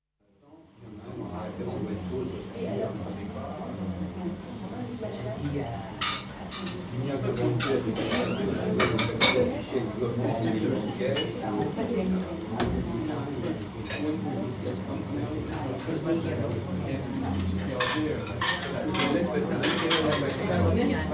France, International conversation in small restaurant, slight vent noise; Restaurant Sound Effects; BBC